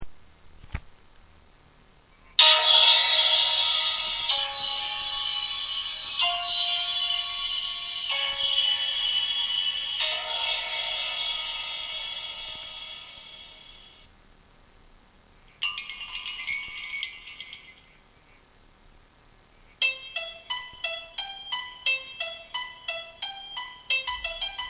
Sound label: ringtones nokia8800; phones